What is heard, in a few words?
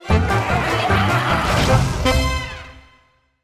Fanfare Win; Mixels Rush; 2015 mobile gamerip